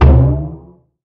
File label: Step; Smite; god Kumbhakarna